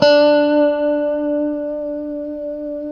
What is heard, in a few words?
D JC120; Guitars; Spectrasonics; Hans Zimmer Guitars VOL-1; JC-STRAT MF